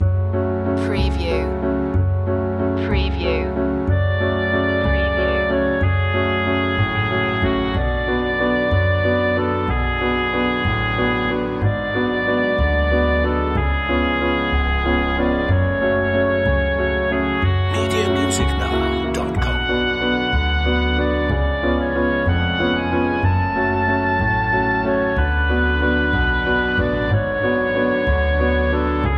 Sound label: mysterious waltz